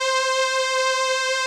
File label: SOLINA, String Machines